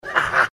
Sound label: haha